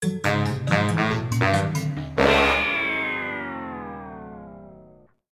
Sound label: Failed; Sitting Ducks; Windows